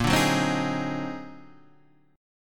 Eb Minor Major 13th Bb

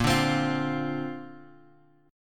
Bb Major Add 9th, Bb, down, Guitar, Open G

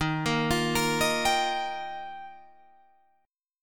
Eb Major Add 9th, down Guitar Drop D

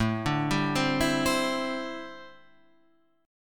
D 9th Suspended 4th A

